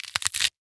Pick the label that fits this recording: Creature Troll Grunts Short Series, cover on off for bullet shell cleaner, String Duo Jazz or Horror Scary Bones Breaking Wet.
Horror Scary Bones Breaking Wet